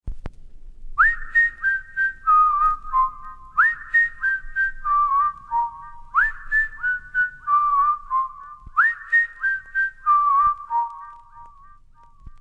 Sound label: Super Whistle